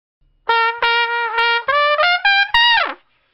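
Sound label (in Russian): смешная мелодия на трубе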